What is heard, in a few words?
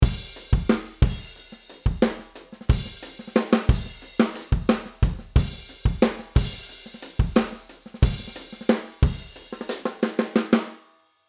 Drums; Addictive Drums 2; Pearl Reference